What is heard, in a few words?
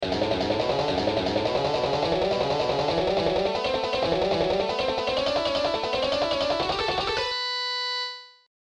Scale Exercise